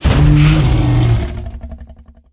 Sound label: Hippo Mount Death Underlay